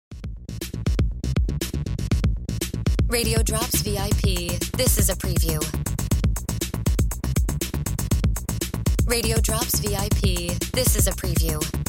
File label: No FX Music Bed